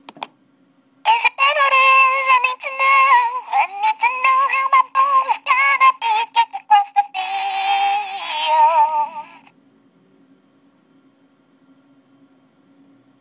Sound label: freestyle song on a voice memo